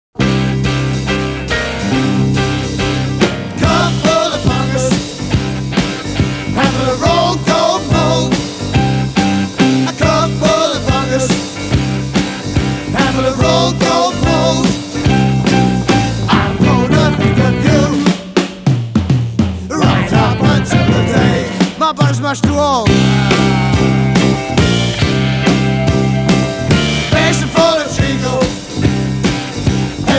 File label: Boogie Shuffle Stomp